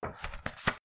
Page Turn

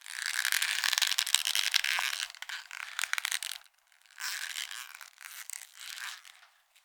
Effect Leather squeak

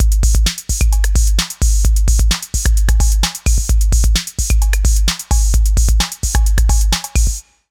Drum loop

